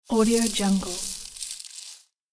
autumn leaves rustle

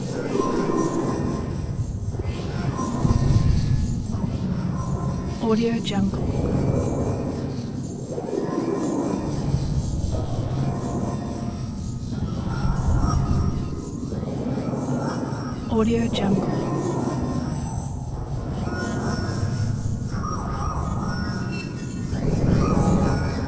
dynamic scifi tweets

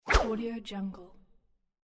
throw away swish

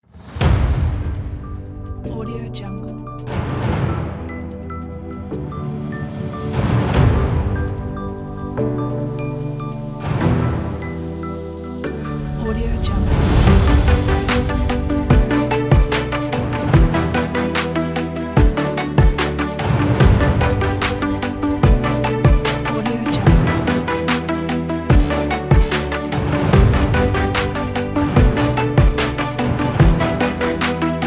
cinematic ambient

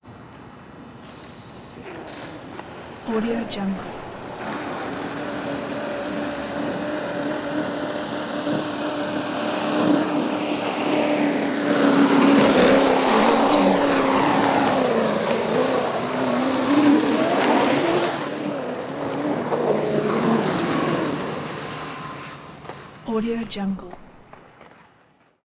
roller skate

